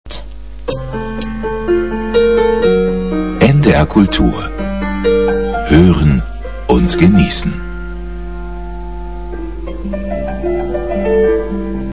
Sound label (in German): jingle klaviermusik; ndr kultur